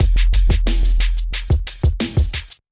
90bpm Heavy Beatz; Recycled

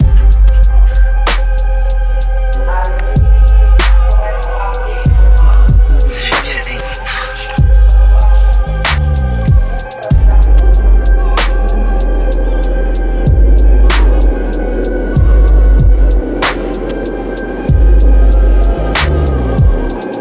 Celtic Trap Loop

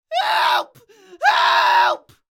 Help Scream Raw No Effects

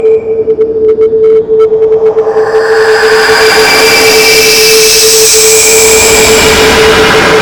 Beam Sequence

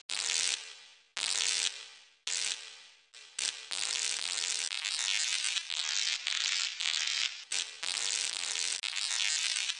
An Extreamly Long Discharge

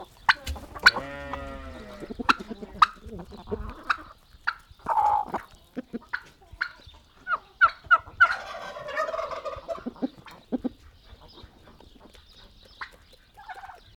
Chickens Rooster

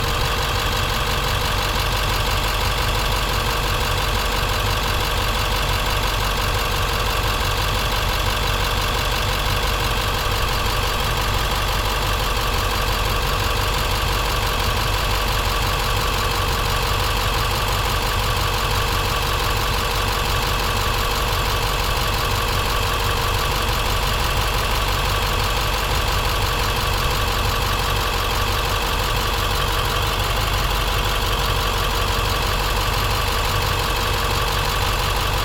School Bus Truck Ext Idle Engine Close

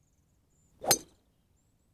Golf tee off

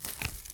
Rustling Grass

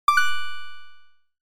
Coin Pickup

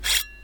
sword

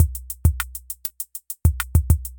beat

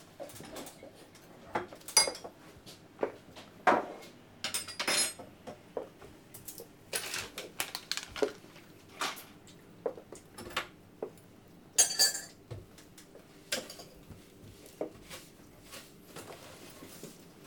Breakfast noises

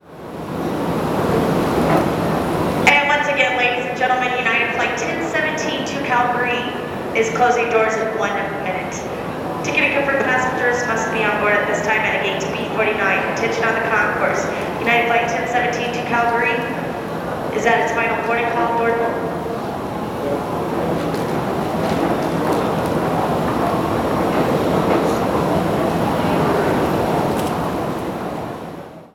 Denver Airport

